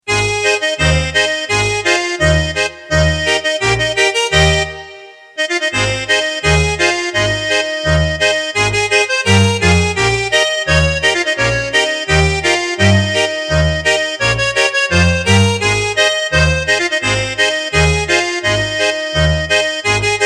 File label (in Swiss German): De Hans blost Bass